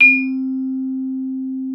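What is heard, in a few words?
vibraphone C4; Xsample VOL-4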